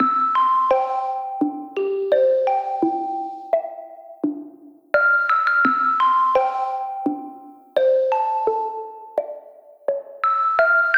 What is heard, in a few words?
loops for cats, sparkly grass